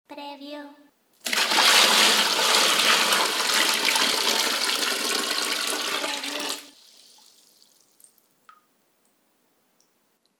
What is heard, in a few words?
Pouring Water